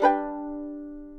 twang, String

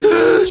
"hoo", voice; ooh ahh

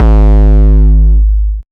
flicker, Kicks Heartbeats

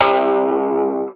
awkward, Guitar

